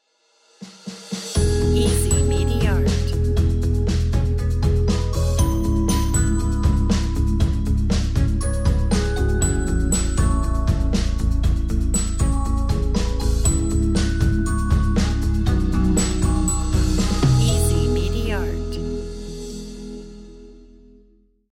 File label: bells and piano corporate logo